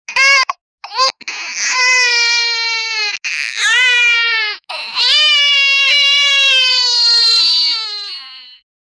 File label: child crying